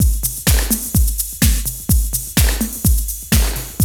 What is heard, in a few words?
D3 BREAK